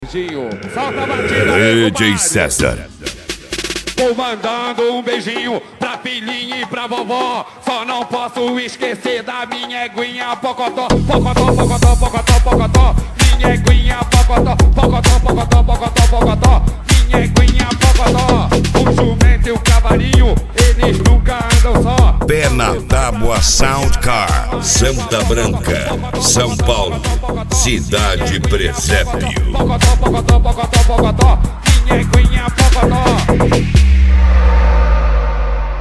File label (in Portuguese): sound car carnaval